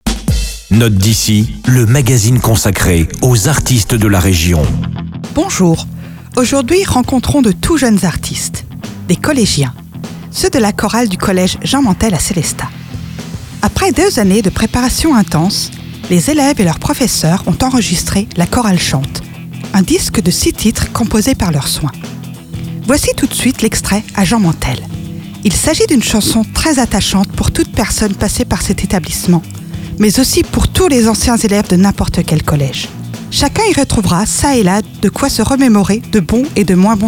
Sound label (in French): la chorale chante; Note d'ici